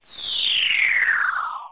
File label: planetary defense run; Sid Meier's Civilization 4 Complete; Beyond the Sword; Final Frontier; Units